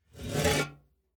Flower Pot Up, Household, Flower, Pot, Pick, Up, From, Wood, Metal, Container, Full; Blast Wave